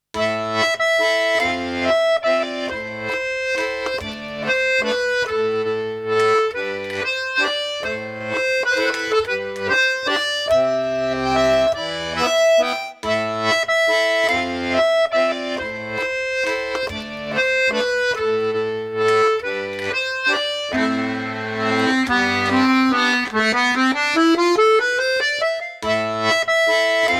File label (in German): akkordeon